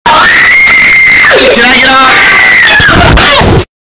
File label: screaming